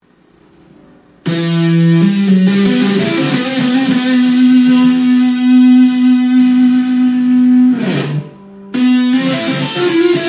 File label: tapping solo improv